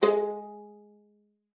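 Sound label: violin pizzicato G#3; Xsample VOL-5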